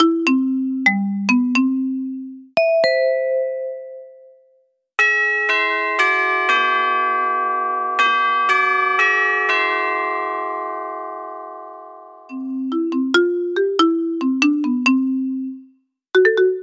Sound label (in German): Melodien, Gong-Kit drahtlos; Gong-Kit drahtlos RW=150m weiss Eurostecker LED-Blitz Lichtring; Carillon radio pour prise avec réglage du volume, veilleuse